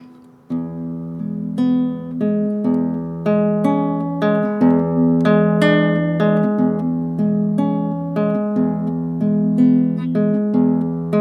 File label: Guitar